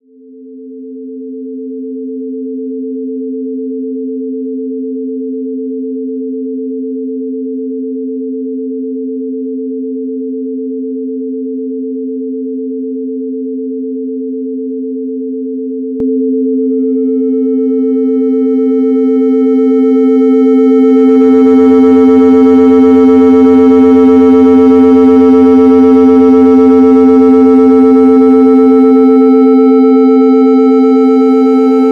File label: Computer Tones